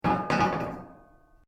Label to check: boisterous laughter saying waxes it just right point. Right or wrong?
Wrong